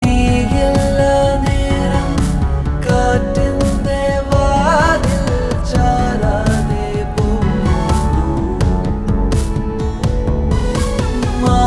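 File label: background music Ringtone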